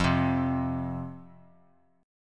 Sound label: F 5th F, down, chord; F5 strummed